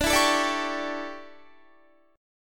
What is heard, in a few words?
D Major 7th Suspended 2nd Suspended 4th D down Guitar 4E 4A 5D 5G 5C; DM7sus2sus4 strummed